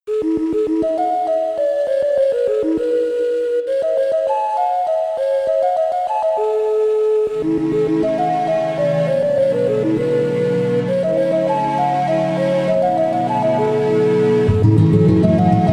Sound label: celtic whisper, 432 Hz